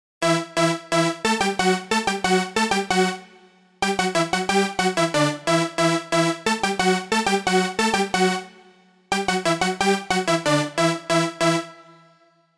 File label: easy piano notes; master; thalapathy; beast; perfectpiano